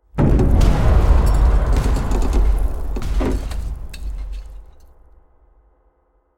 Rumble Stinger; silent hill homecoming 2008 gamerip pc ps3 x360

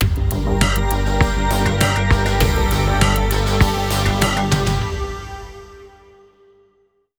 Game Over; Zombie

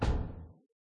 Slide Thump

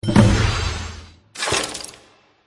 Click Bag

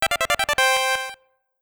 Power Up!; solar striker gb

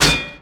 Common Buckler Defense; Inotia 3

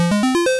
Sharpen; Pokemon; Gen 2; attack moves; GSC

